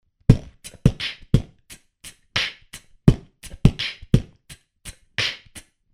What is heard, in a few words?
beatbox loop reverb